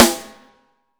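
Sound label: SNARE, Hip Hop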